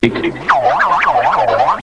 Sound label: Boomerang; How Would You Survive; Grolier; EGYPTW